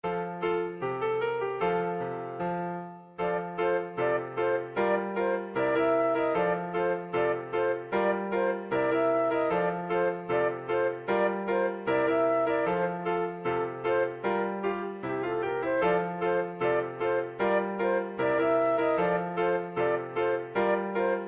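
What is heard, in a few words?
rainbow flute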